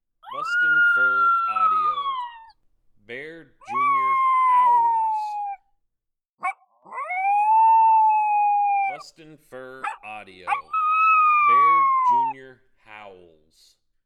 Bear Jr Howls

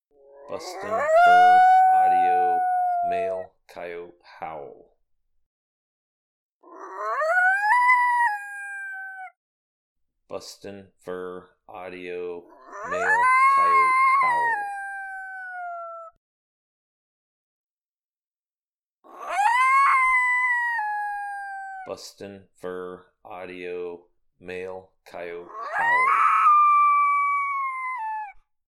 Male Coyote Howl

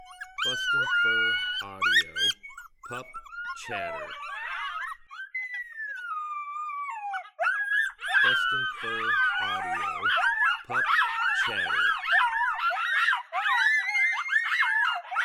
Pup Chatter

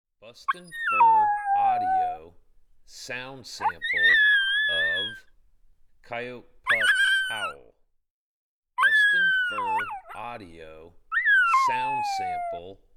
Coyote Pup Howl

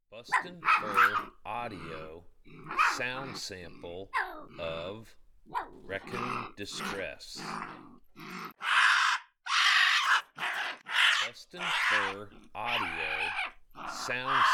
Raccoon Distress

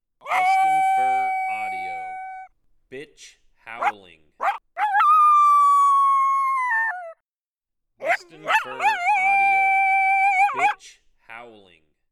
Bitch Howlin

